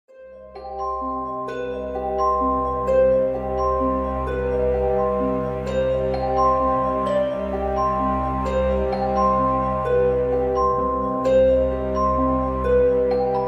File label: creepy